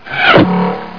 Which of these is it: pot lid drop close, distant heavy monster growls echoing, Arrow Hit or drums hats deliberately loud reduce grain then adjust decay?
Arrow Hit